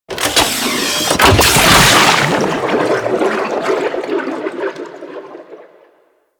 Sabotage Reactor Coolant Cell Insert; Warframe; Missions

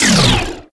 Ink Rifle End, Weapons; Warframe; Tenno

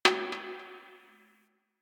Garbage Metal Can

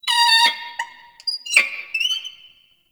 Owl Critters Vocal Idle; Ambience; Warframe; Duviri

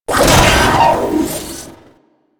Auto Turret Agent Open; Warframe; Venus Turrets

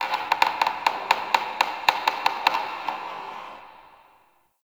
Zariman Wood Creak; Warframe; The New War; Ambience

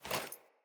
Foley Action Default Sword Throw Wind Up; Player

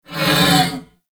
Ram Pain; Animals; Duviri

